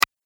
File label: Click Sound